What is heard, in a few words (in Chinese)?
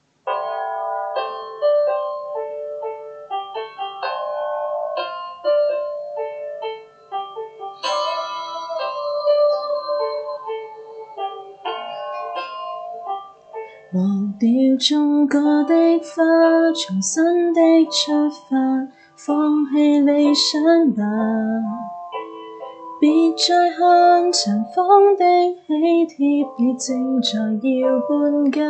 伴奏